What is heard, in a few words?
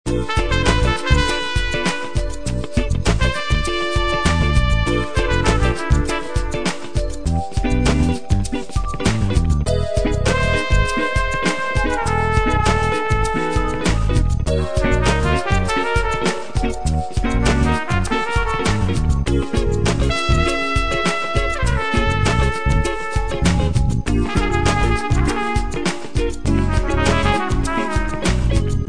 Funkjazz